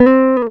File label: GTR TRIK, Jazzistic; Univers Sons